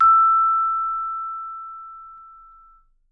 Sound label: E6, Percussion & Harp; Miroslav Vitous